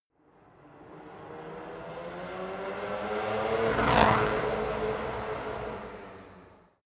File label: racing car f1 drive by